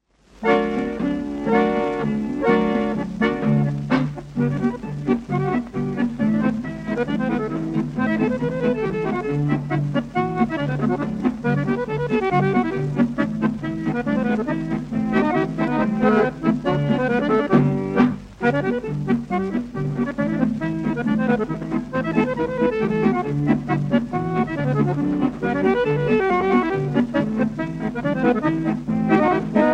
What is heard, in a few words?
polka; Shahnov; Koncertnaya